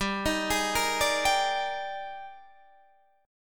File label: D sharp Major 11th, G, guitar chord